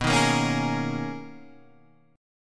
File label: B Major 13th B, guitar